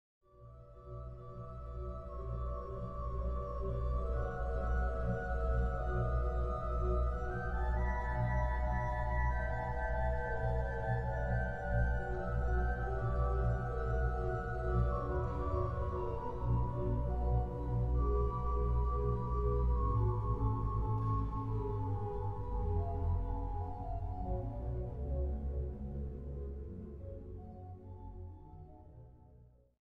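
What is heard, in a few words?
Scherzo, orgel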